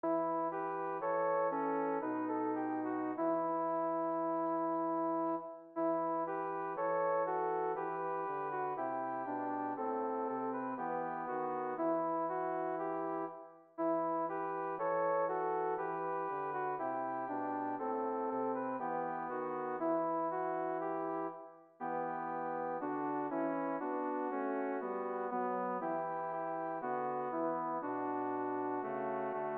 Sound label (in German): Bläserbegleitsatz